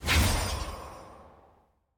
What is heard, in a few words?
notif trade request declined; champ select